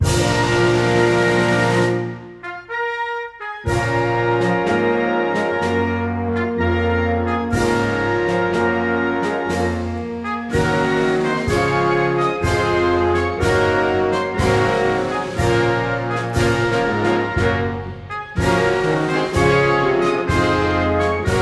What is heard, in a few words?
National anthem of Tajikistan, performed by the U.S. Navy Band